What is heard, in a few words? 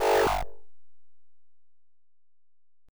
Menu Error; castle of no escape 2